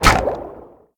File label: Shark Bite; Lagoon